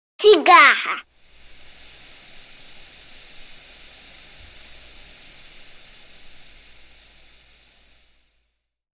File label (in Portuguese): Cigarra